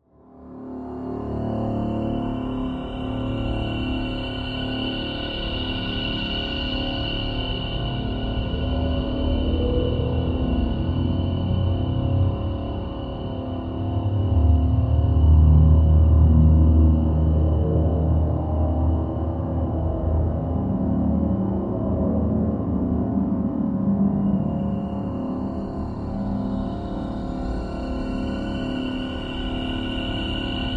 Bed Tonal Low Swell, Production, Element, Bed, Tonal, Low, Swells, Eerie; SoundEffects-BlastWave